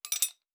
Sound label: Metallic Gear; Survival Game Sounds - Lite Edition